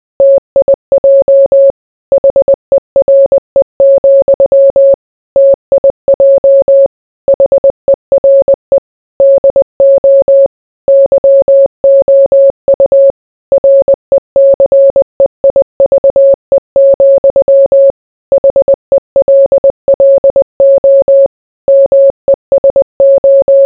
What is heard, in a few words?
morse